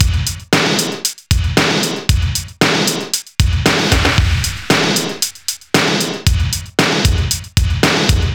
Beat Hunted; Zero-G; Future Wave; Beats

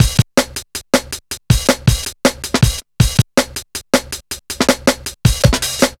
160, Drum Loops; Total Drum&Bass

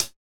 DUAL CHH, LOFI HATS

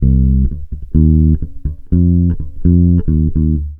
NIGHT 63 C, Bass Grooves; USB Soundscan vol.30; AKAI

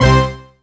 O-HIT, HITS

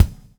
BUBBLE; Spectrasonics - Backbeat

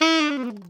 ALTO SRT FL E 3, alto sax; Greytsounds - Philip Wolfe David Crozier's Saxophones

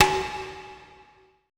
E TAB SLP B

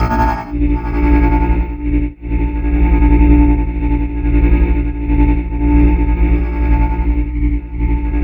VOCODE, Twisted Textures

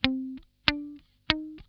NOTE SCALE, Funk Guitar; 90 s Sample CDs; Zero G

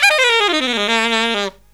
SAX, Killer Brass Riffs

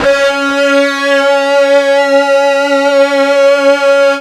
FUZZ C#3, ULTRA FUZZ, Guitar; East West; Steve Stevens Guitar Samples Collection

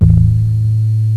bass harm; jazz jackrabbit 2; M8

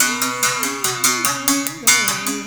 BERIM GRV, BERIMBAU

